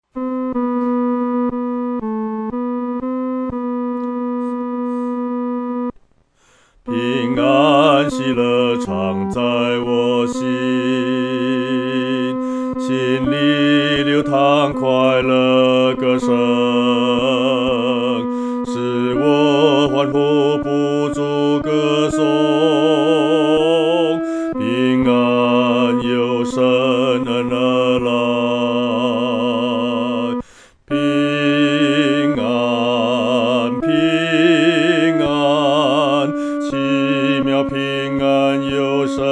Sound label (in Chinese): 独唱 第二声; 奇妙平安由神来